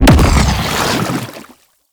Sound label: Alt Projectile Impact Splash; Weapons; Warframe; Tenno; Ink Rifle